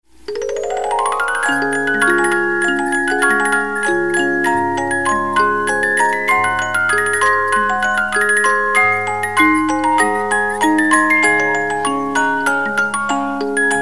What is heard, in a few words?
50-Note Movements; O Suzzana